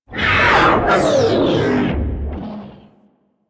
Sentient Ship Attack Maneuver; Warframe; Enemy Ships; Space Fighter Sentient Coil Agent

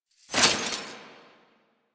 Mech Release, Rifle; Weapons; Warframe; Thanotech; Trumna Prime

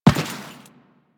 Step Default, Footsteps; Warframe; Teshin

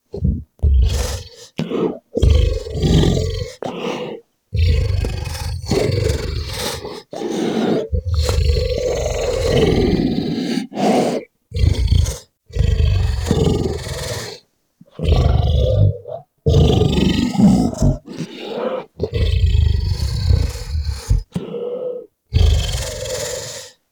Biped Vocal Loop; Warframe; Man In The Wall

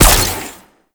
Weapon Fire; Warframe; Sentients; Dolicholyst

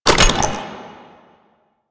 Hack Minigame Mech Action E

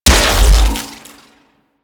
shock rifle shot; Warframe; Weapons; Corpus

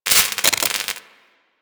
UI Crafting Spark A; Warframe

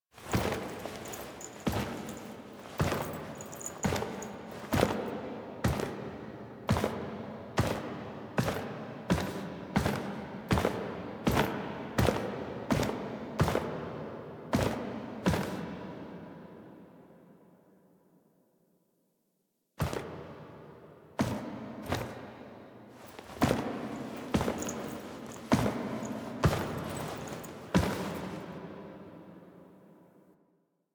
Foley; Warframe; Quests; The New War; Sealab; Hunhows Lair; Drifter

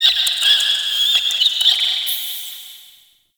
Rat Vocal, Crypt Ambience; One Offs; Champion Crypt

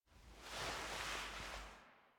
Apartment Foley; Warframe; Zariman; HubApartment

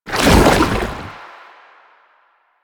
Chem Strike Goo Layer, Foley; Enemies; Soldiers; Footsteps; Warframe; Lasrian; Nox

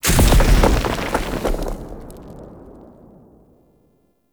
Drunken Flame Pillar Burst; Characters; Enemy; Abilities; Circade

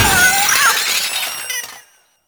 Infested Cat Loot Crate Destroy; Warframe; Nineteeen Ninety Nine; Cat Loot Crate Common

